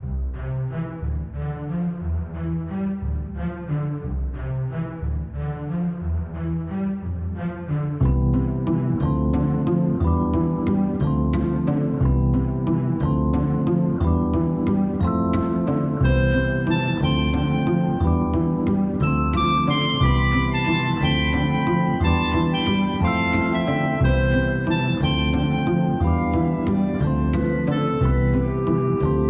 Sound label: upbeat; dark deity